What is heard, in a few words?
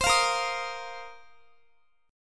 Bb Suspended 2nd Suspended 4th Bb Guitar; strummed; 4E,4A,5D,5G,5C,6F; x,x,22,22,22,22